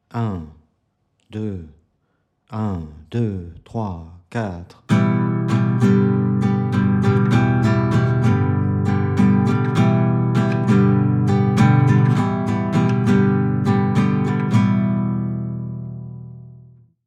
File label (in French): exercice 100bpm